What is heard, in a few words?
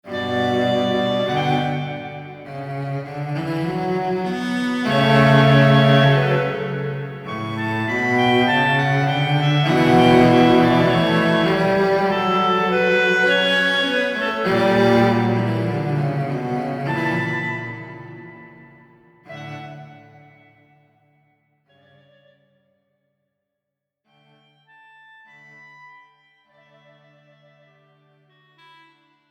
Cello and Clarinet